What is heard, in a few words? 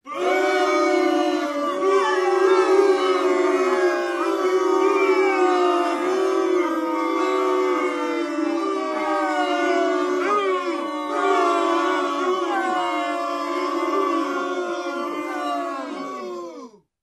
Crowd booing